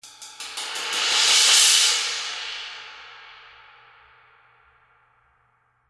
21 K Custom Special Dry Trash Crash Build Crash, cymbal